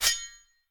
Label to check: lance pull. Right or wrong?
Right